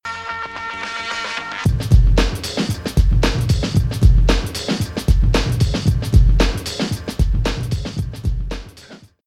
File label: Sting - Beat